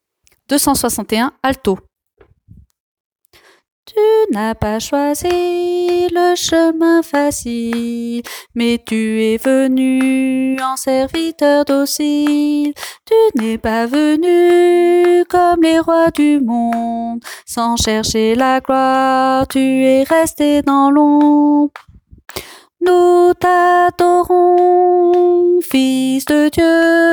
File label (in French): alto